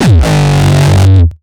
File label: kick; shock. Ultimate Kicks vol. 2